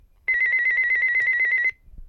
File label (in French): Telephone GIGASET DA710 Sonnerie